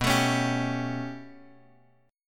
B 7th Suspended 2nd Sharp 5th B down Guitar Drop D